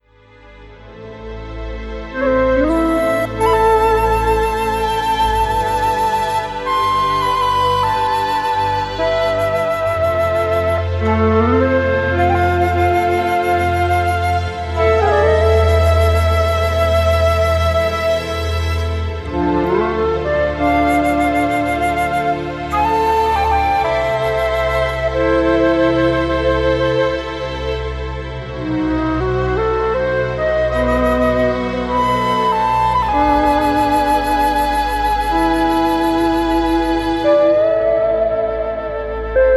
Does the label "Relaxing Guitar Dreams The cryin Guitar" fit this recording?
Yes